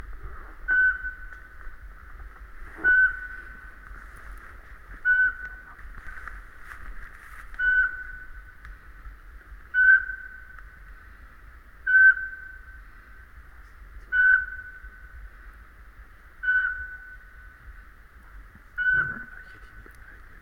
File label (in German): sperlingskauz